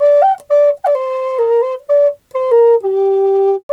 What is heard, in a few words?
G 130, FLU RIFFS, Didgeridoo and Other Primitive Instruments; Big Fish Audio - Didgeridoo and Other Primitive Instruments